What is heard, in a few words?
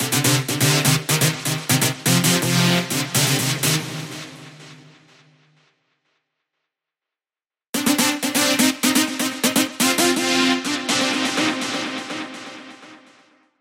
Serum Lead